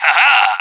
whack popup; moustachio

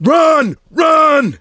yell run; survivor

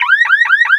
car alarm; vehicles

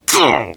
hurt major, survivor voice, biker

